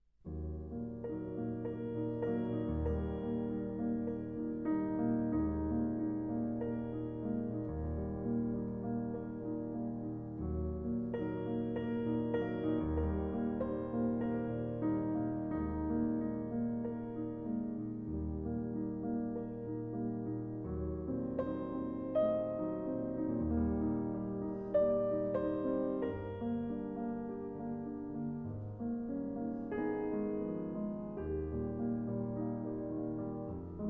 Cello Sonata in G minor, Andante; Rachmaninov; op. 19

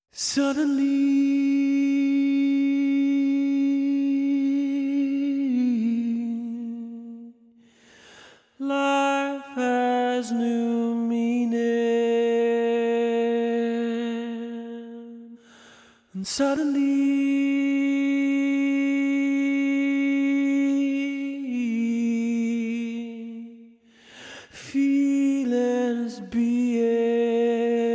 Orchestral Version

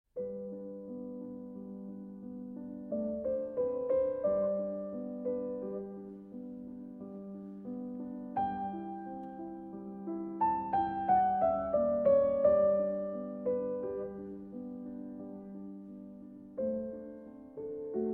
Piano Sonata No. 16 in C Major II. Andante

